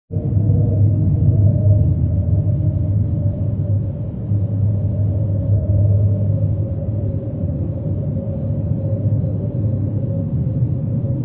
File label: cave wind